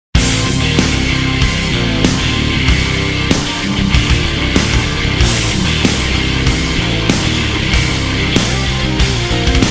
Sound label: tecnohard